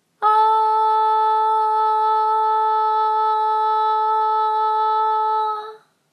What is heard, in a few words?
long tone; vocal